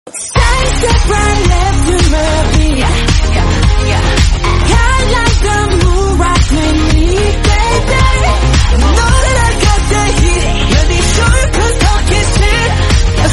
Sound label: rocking music call tone ringtone